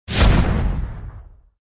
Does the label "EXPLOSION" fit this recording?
Yes